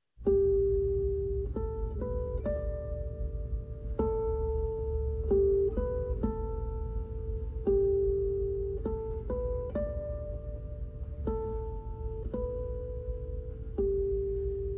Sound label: guitar, Welsh Lullaby; Suo Gan